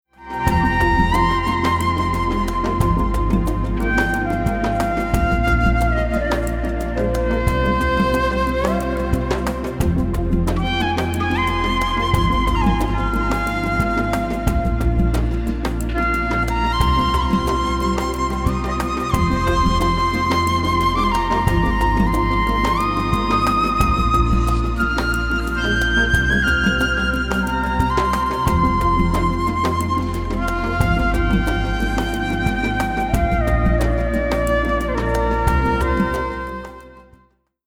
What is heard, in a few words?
Bansuri